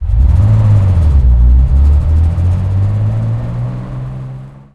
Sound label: valentine engine int load, tanks